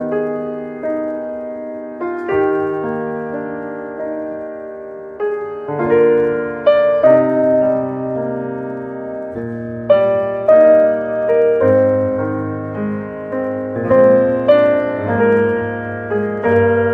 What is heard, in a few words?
Samsung Galaxy J2 Core Official Stock Ringtone